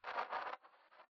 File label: static; Titanfall 2